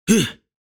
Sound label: fighting vocal toss male voice fantasy; dungeon master; fantasy character